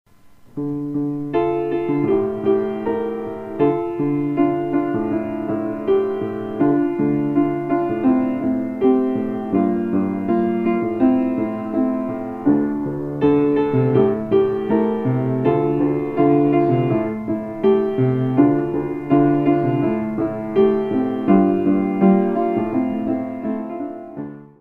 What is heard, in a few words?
piano